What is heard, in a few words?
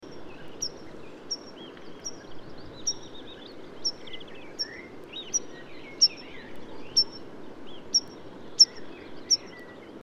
pip littoralis; no runde